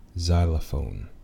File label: xylophone